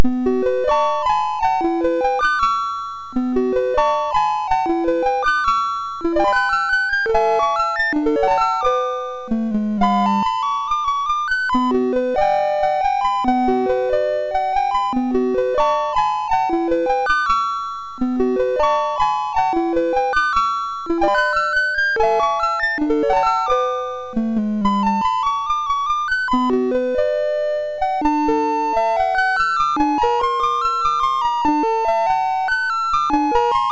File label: sid MOS6581R3, GAMES; Euroopan Kartta 64